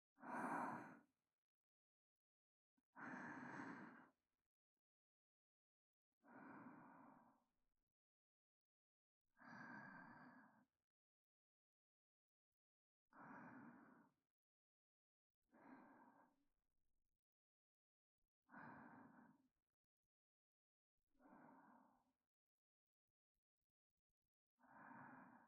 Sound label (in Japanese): 添い寝音声, ASMR; 稲荷神縁結語～初春の章～